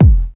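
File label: bd, 808 bass drum; Best Service Gigapack 2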